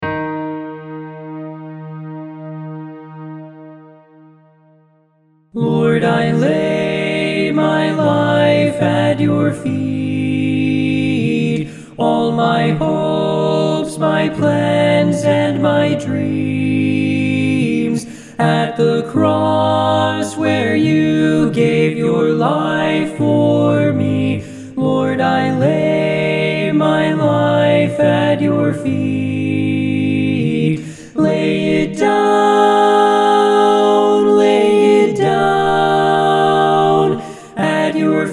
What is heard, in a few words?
ALTO